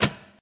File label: killswitch off